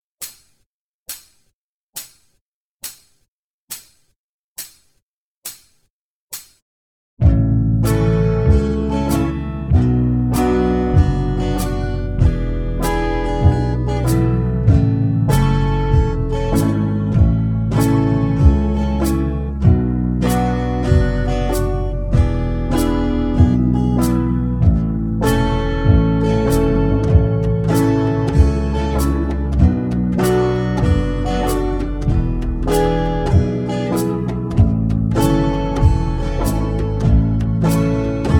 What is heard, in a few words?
key of a woodshed, backing track; dunphy's hornpipe